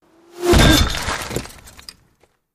Bullet Impacts Hitting Masonry With Whir In And Debris